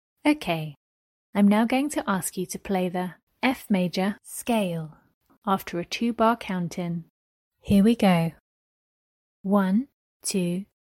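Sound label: F major scale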